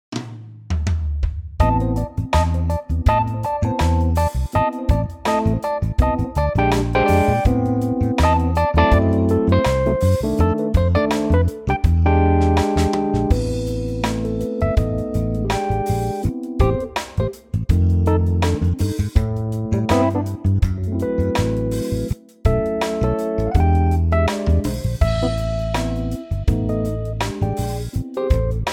Am smooth

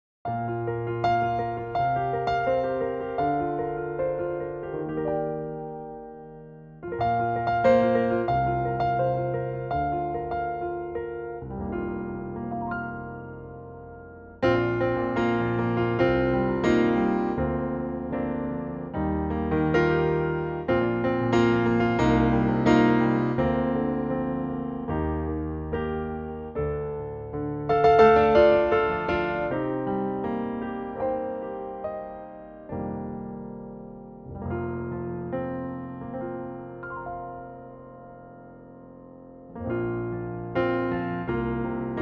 Bb piano

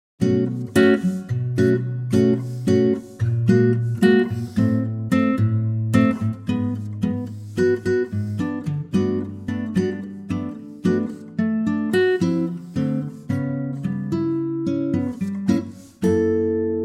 Bm Ac Gtr; key - Bm - vocal range - B to A; Beautiful acoustic guitar arrangement; bossa

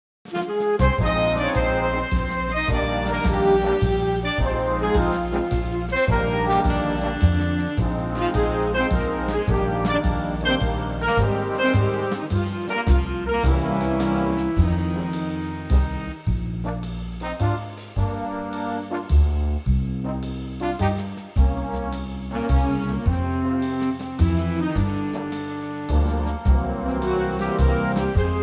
big band Eb

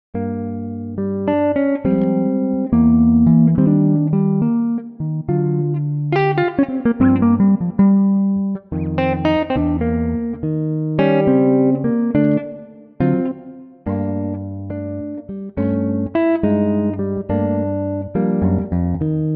Gm Les Paul Guitar

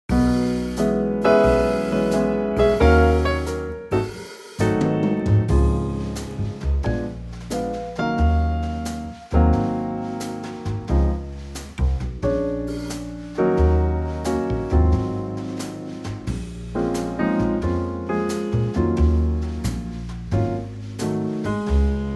Trio Db

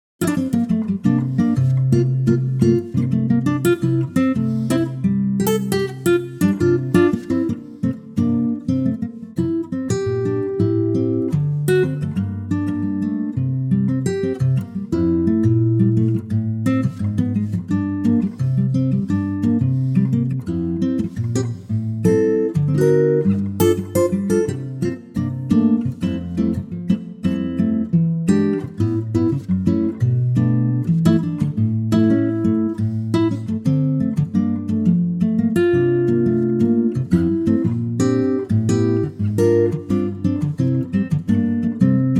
F Acoustic Guitar

